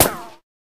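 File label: ricochet, guns hits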